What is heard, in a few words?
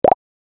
Bar chart pop in animation infographics